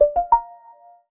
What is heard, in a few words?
reminder